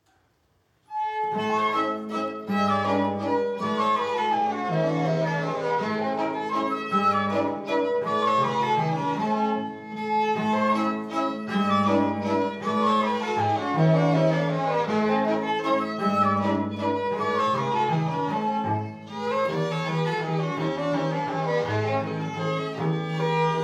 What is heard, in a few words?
waltz